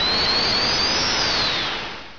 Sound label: spindash; Sonic1